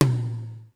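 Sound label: high hall tom; sr16 tom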